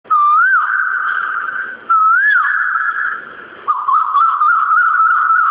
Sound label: koyal ringtone; ringtones